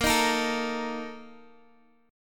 Bb Major 7th Suspended 4th, Guitar, DADGAD; x,13,13,15,12,x

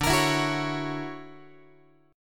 D Augmented Major 9th, Guitar; D+M9 strummed; JGuitar

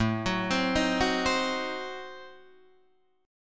A Diminished 7th A down Guitar Open D

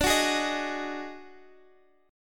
Eb Minor Major 7th Double Flat 5th

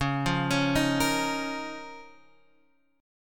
C sharp 6th Add 9th

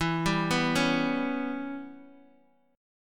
E Minor 6th E, Guitar Open D

